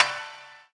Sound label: Pickup; Totally Angelica Boredom Busters